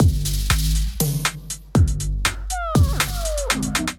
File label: Kit, Drums; Fear Pressure Kit; Ableton; Skitter and Step